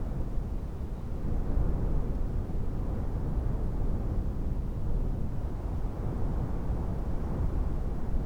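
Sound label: breeze blow ambience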